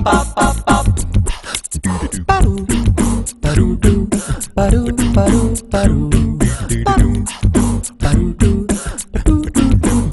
playful, ringtones; Motorola PEBL U9

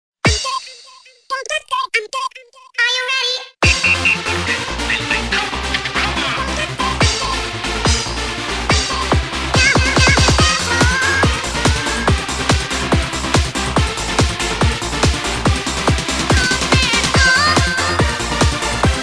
midi ring

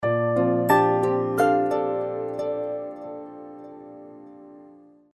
Chinese Power Off; Samsung SGH-U800E SoulB

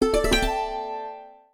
Dimple; Huawei Ascend P6

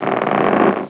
plant move; Nokia; 5800 XpressMusic; Bounce Touch

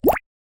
Water Drop Notification Evening; Xiaomi Redmi-Note-6-Pro

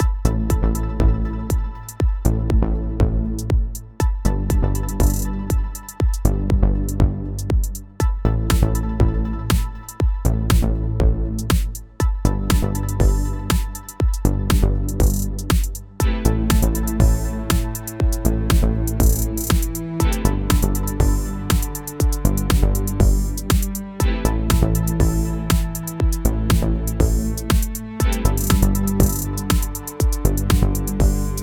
Warmup, ringtones; Samsung; Galaxy A56

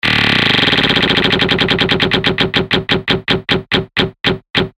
roulette

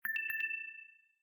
Low Battery; Nitro HD P930

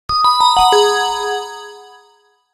Alert Slow Down; HTC Touch Diamond

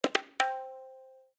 Tabla Roll; Samsung Z2; Alerts

